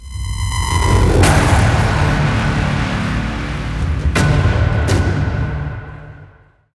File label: Mission Complete Cue; Warframe; Cues